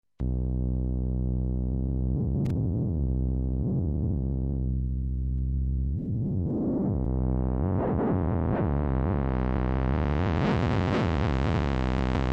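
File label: redsquare, synth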